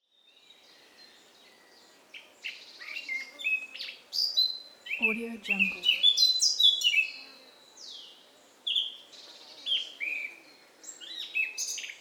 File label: midday summer bird song near forest; mountainaudio